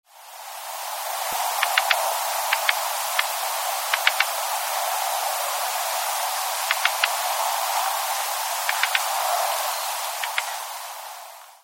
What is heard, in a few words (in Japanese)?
joubi